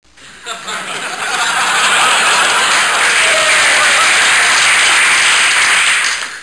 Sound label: Applause, Laughs Large Crowd; Human; Soundsation